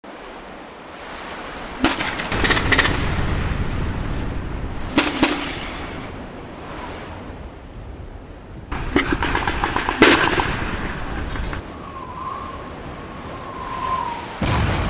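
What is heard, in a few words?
battle, ambience stereo; mohaa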